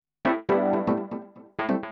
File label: organ house 125 A; eJay; Keys